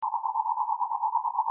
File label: bark